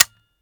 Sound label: fire first; weapons; gmod; viper